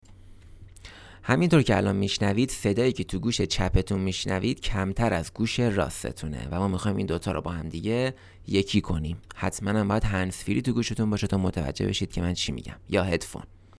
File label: Music exercise file